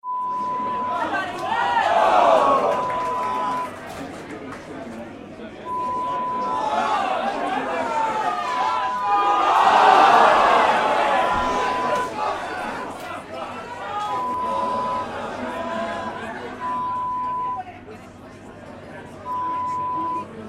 Football crowd sound

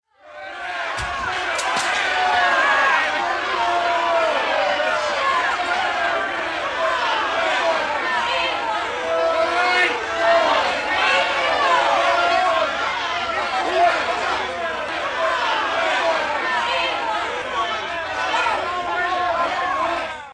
angry crowd

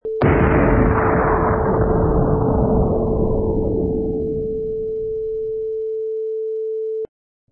Bomb Explosion

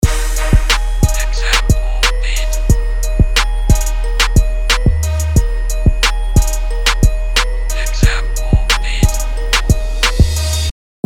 Drums

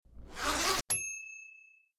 Zipper

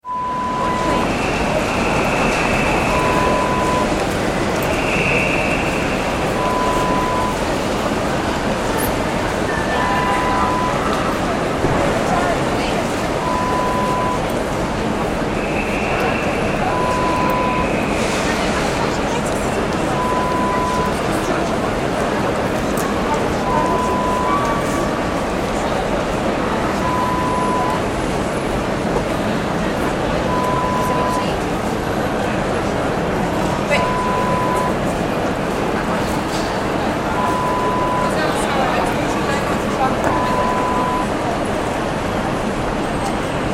Station ambience sound

